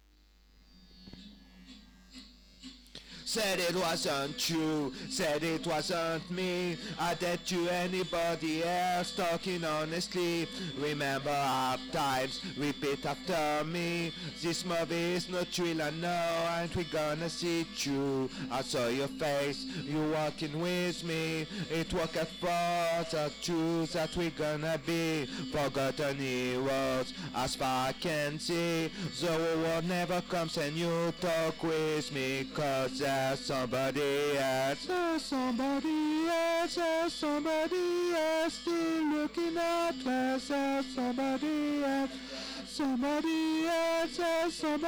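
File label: Vocal Part 1 full Backing vox on Choruses; REMIX KIT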